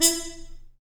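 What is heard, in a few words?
brass trumpet mute stab, Harmon stabs; Harmon Amb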